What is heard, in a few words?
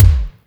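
Bass drum, Percussion; Giga Samples Collection; ELQ-DrumQit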